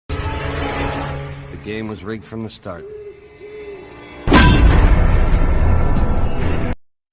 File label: bonk